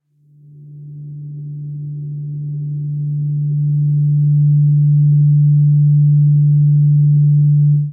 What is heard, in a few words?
Mirror Forks; Instruments; Solfeggio; Ableton; Drone Lab; Xtonal